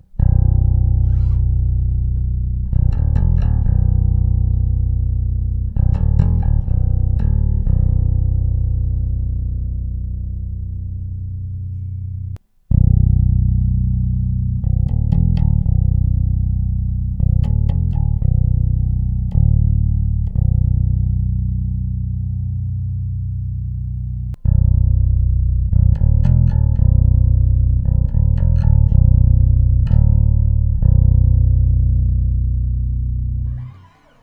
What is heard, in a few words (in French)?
corde de si, basse; cort custom